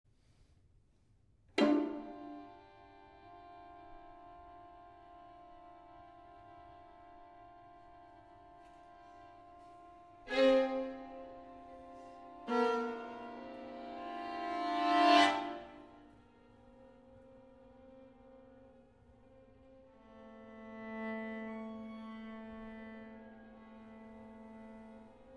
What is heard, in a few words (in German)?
Streichquartett